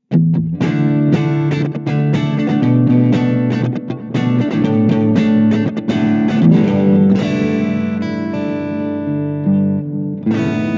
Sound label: Blackstar LT Echo 15